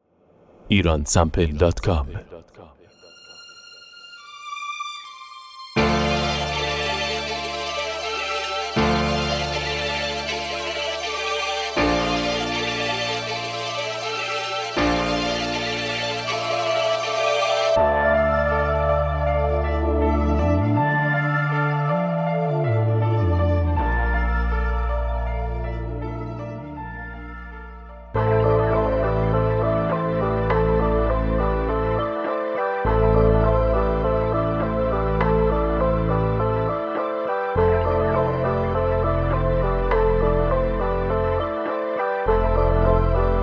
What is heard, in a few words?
Beats